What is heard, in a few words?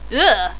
urgh